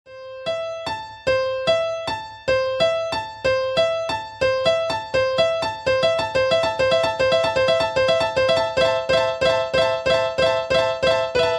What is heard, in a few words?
piano cover; Кавер И Пародийные Рингтоны; Зарубежные Рингтоны; Тик Ток Рингтоны